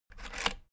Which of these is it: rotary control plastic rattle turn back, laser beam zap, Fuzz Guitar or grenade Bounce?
rotary control plastic rattle turn back